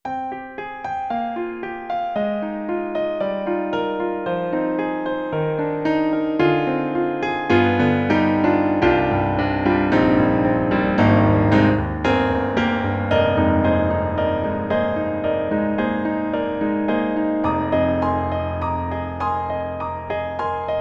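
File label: Roland SonicCell Concert Piano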